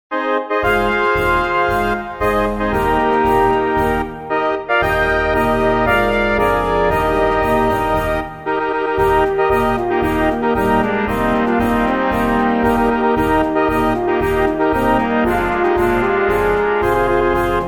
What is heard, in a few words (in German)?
Marsch